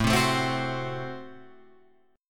A Minor 6th A Guitar Open F; Am6 strummed; 9,7,9,7,9,7; JGuitar

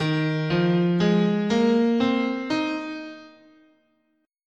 D sharp Yo, scale

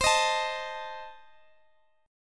C Minor 6th, C, down, Guitar, Standard; strummed; capo 3

